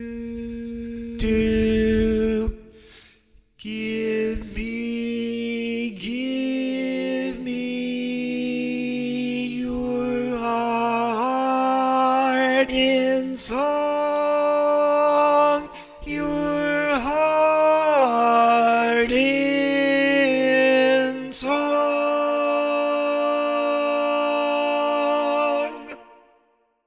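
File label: baritone, barbershop tag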